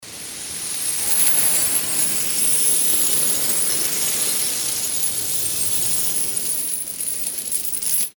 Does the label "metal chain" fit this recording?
Yes